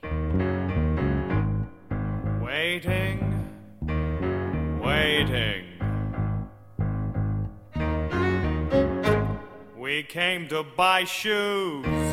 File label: Trio